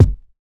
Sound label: low tone kick single hit f sharp key; Mono